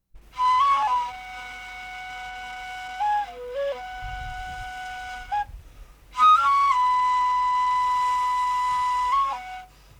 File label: Burari (Flute) Solo